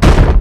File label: footstep